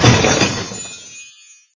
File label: Vault opening animation